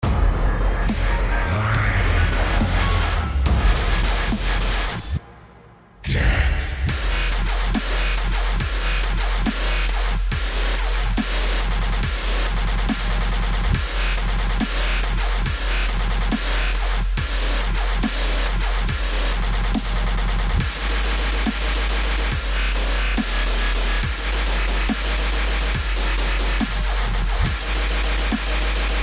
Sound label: Remix